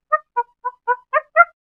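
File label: monkey idle normal; ambience avant gardens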